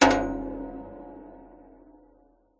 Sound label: impacts metal strike hit sharp tap long rich reverb designed